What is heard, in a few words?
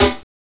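SD, snare drum; X-Static Goldmine 1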